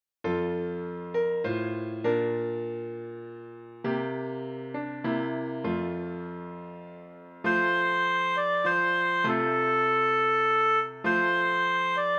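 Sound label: Silent Night, Oboe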